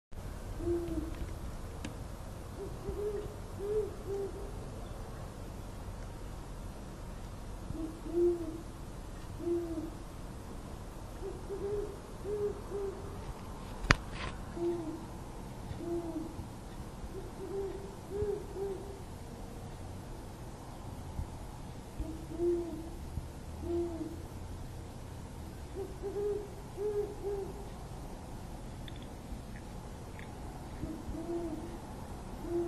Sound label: Hooting Owls at 4 am, great horned owl; davis